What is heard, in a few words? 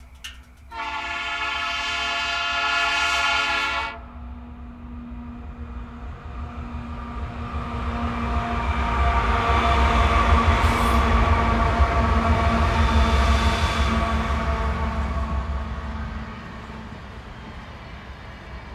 Coal Train